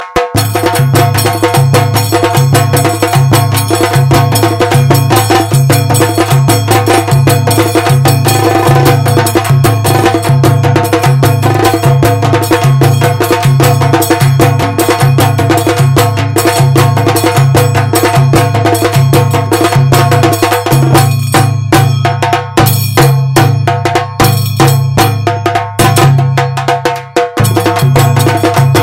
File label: indian drums